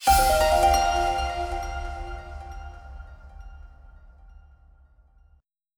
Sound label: Positive Jingle; fire emblem echoes shadows of valentia